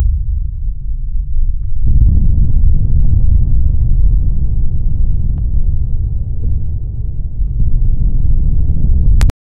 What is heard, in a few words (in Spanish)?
sonido de terremoto; cave; sonido de terremoto de 9